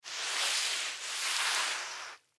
Mop Floor, Mop Floor Two Strokes; Cleaning; Household

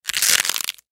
Horror Bone Break Rip Tear Crunch; Injuries; Combat; Blastwave

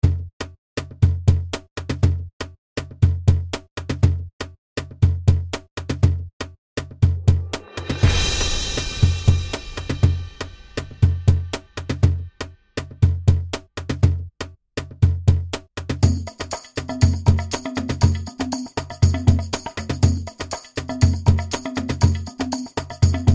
Tribal Sequence Cymbal Swells Hits; Composition; Blastwave

